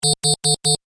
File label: Electronic Alarm Loop; Alarms; Electronics; Alarm Sound Effects; Stuart Duffield